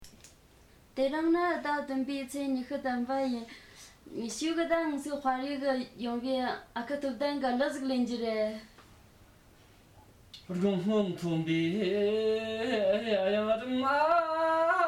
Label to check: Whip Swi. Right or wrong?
Wrong